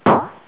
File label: explosion; abalis